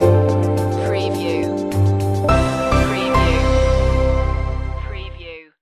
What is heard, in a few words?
corporate heart stinger